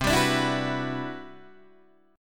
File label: C-Major Sharp 11th, Guitar DADGAD